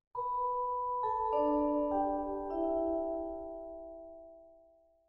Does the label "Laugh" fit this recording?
No